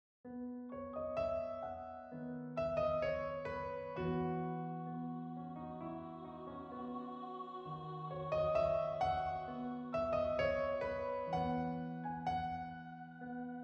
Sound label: GTR